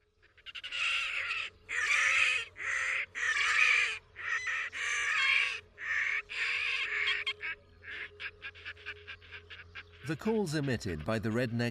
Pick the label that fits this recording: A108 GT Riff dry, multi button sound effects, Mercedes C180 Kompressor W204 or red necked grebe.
red necked grebe